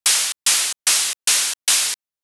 saw

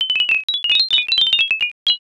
pachinko

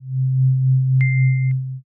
final tension

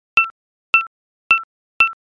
drip

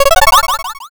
picoquestion

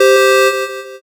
buzzer end

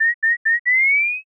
violin fear

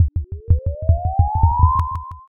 pulse

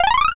tick up

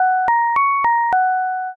departure melody

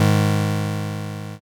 power chord

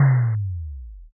impact text

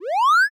reverse

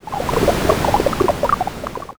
underwater

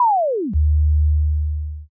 time stop

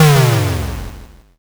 explosion m

